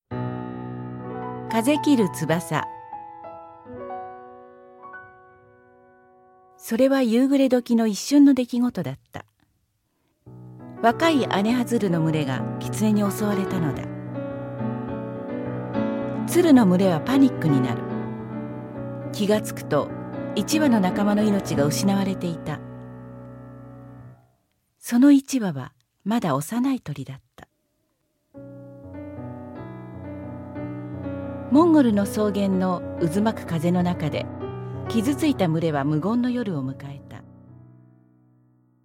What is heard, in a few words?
BGM